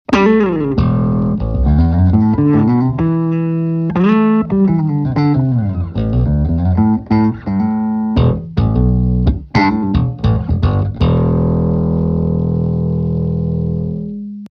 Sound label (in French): bass en phase, guitare